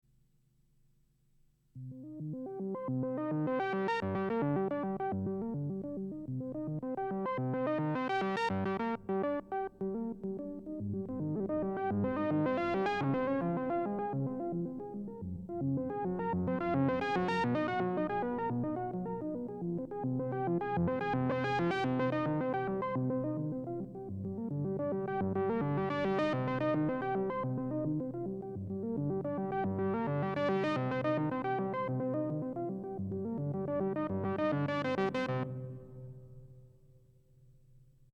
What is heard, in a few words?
Moog Spiralhall